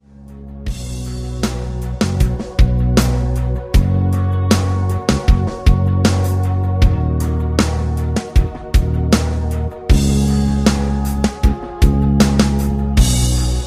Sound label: rhythm